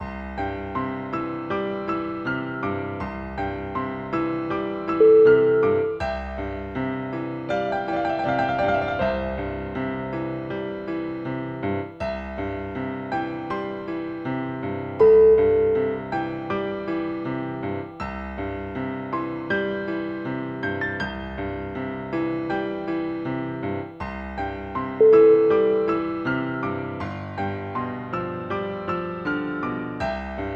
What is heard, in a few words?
victorian piano